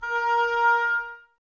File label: OBOES A#, Woodwind; Wood Winds; Oboes Links; Miroslav Vitous; Woodwind Brass Ensemble